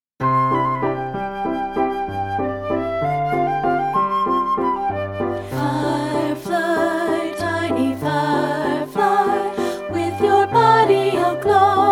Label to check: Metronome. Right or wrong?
Wrong